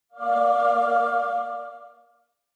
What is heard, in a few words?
Angel Short